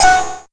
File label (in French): perte Fuild; Puddle